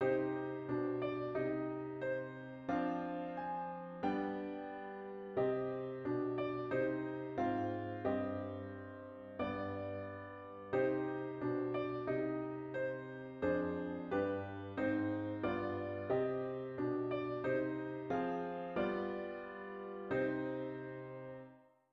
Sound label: Harm altered minor